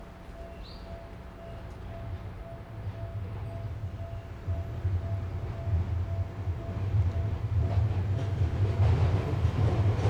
xy train

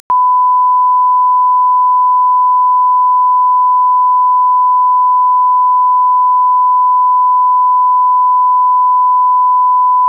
sine 1000

